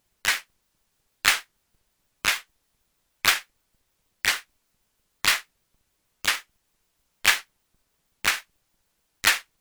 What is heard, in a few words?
Clap